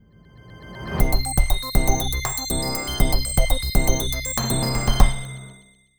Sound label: jingle info